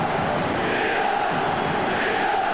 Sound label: CHANT; swos-soundset